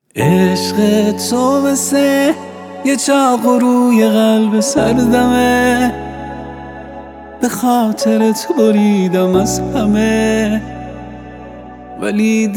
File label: Piano Version